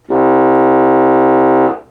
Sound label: trombone 16